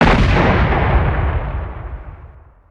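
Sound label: dist explosion, distant explosion, ambient outdoor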